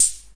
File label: Ace Tone Rhythm Ace FR-1, drum machine; drum machines